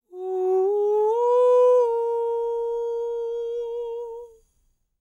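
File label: falsetto, Vocal Planet Jazz FX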